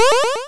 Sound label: bounce